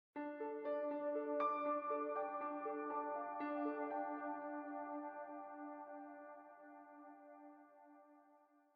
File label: Citadel Piano; Frozen Flame; windows